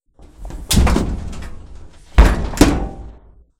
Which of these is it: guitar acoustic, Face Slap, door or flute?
door